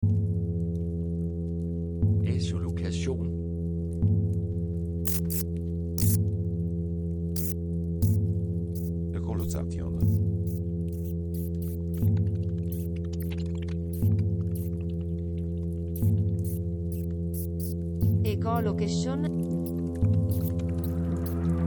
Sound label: Echolocation Songs of the Humpback Whale; Wave Farm